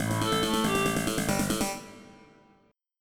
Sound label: fill in